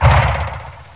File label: Bosshit